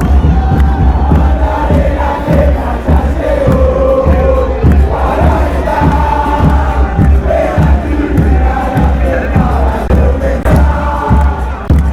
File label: huracan chant